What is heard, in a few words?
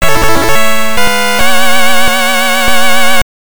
Get an Important Item; GB